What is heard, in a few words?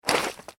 human footstep slushy ice; Single Footstep On Slushy Ice; McKinney Sound